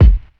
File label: fat bass drum f sharp key; kick drum samples